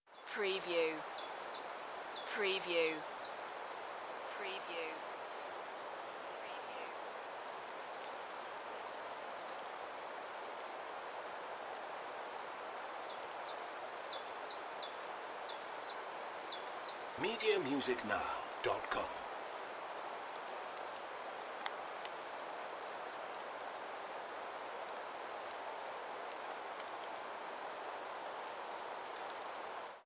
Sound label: Forest - Light rain with evening bird song; Beddgelert Forest; beanstalk audio